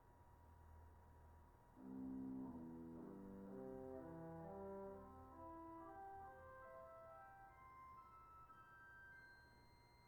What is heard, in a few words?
choir vila de gamba, organ; 8’ Viol De Gamba